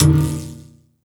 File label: PERC, Drum & Bass, 170 BPM